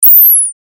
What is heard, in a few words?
lazer